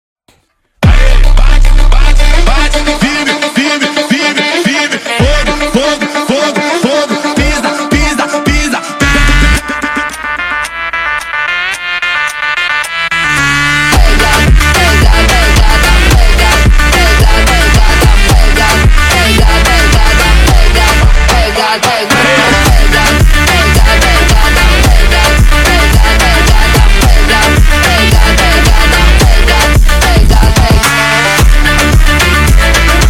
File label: Phonk MONTAGEM